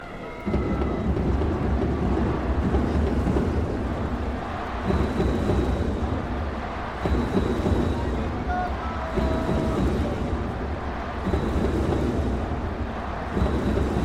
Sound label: baseball stadium